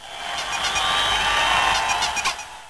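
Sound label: Cheering with squeaks; Snavely! 1.0.4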